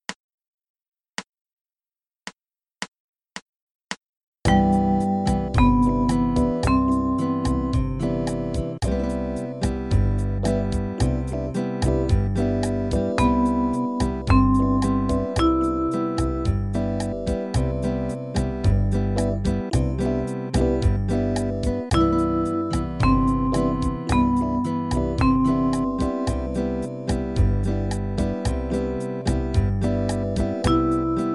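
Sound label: Maj7 Second Inversion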